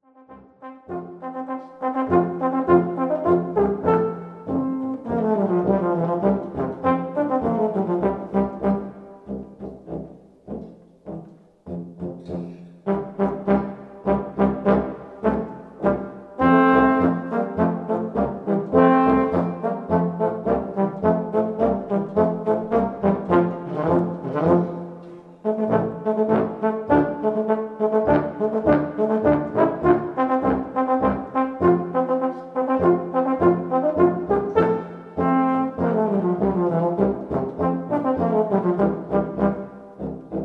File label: Euphonium Meets Piano, Live; Steinstadl 2019; Yorkscher Marsch